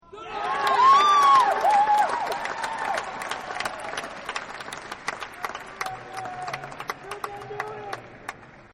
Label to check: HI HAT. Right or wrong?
Wrong